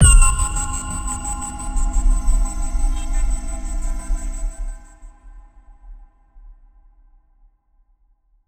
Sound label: Rotor Blades